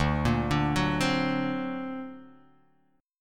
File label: D Augmented 7th down, guitar; D+7 arpeggiated; JGuitar